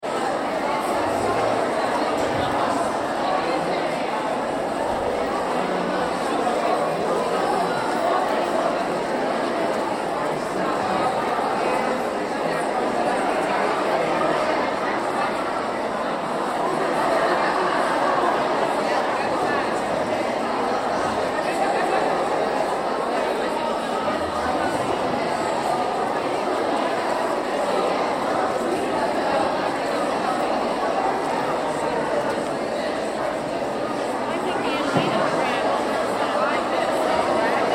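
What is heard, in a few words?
Crowd Women's Conference Lobby Busy; Crowds; Big Room Sound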